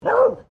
ANIMAL DOG BEAGLE FEMALE BARK; Various domestic dogs; Dogs (Sound Effects:Animals)

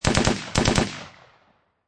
Machine Gun Ak-47 Short Burst Double

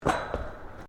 Footsteps Boots Suspended Metal Walkway Step; Footstep Foley; Sound Effects:Human

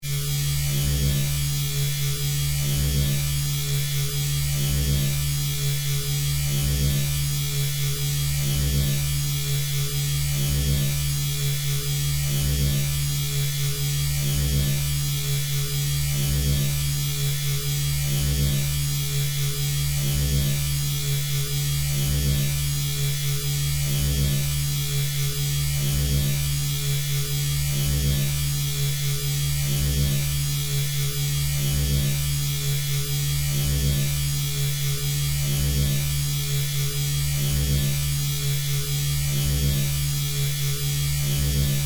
Force Field Energy, Source; Science, Fiction; BlastWave

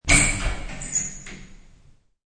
Old shower door is opened.; Part of Shower Doors (Sound Effects:Doors)

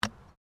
Digging Small Handheld Tool Rocks

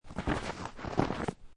Snow And Ice Snow Movement; Weather

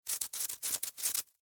Snow Dig; Animal; Snow Sound Effects; Snow (Sound Effects:Weather)

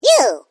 Created Creature Small Eww, Alien Sound Effects; Created creatures, vocalisations and pretend speech

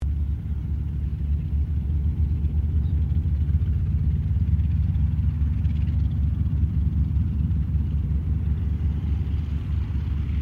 AIRCRAFT CERES WINJEEL WIRRAWAY TAXI DISTANT; Mixed aircraft squadrons; Airplanes; Vehicles

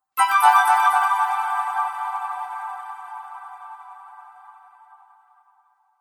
Ethereal Magic Sparkle; Magic Sound Effects

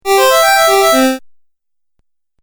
Game Music Phrase, Video Game Sound Effects

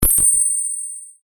UI Deny, User interface feedback sounds; Ui Set A; Computers; Electronics

